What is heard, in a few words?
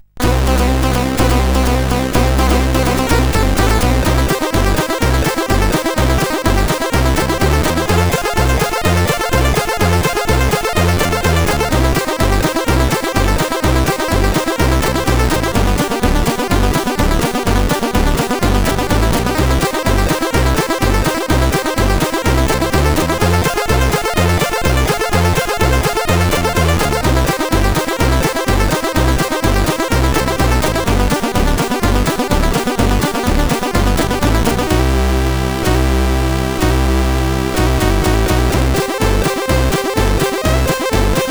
Country Song, CSG8580R5